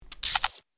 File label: Night vision goggles putting on; military equipment sound effects